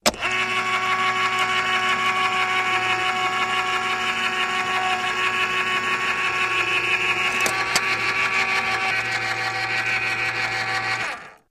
Can Opener Elect Ope, appliances

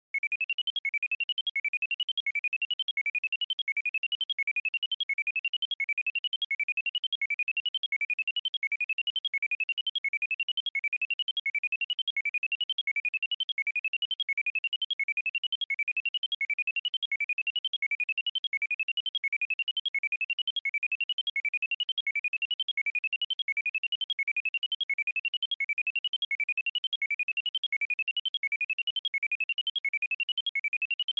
Alarm Beep Rising

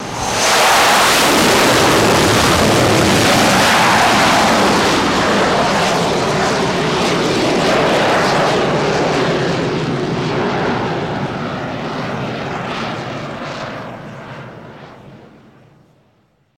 A7 Cat Take Off, A-7 Corsair

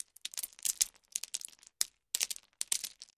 Landscape Rock On Con, rocks & dirt

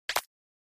Button Elect Zip Tase

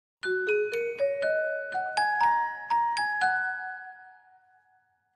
Clavichord Chinese

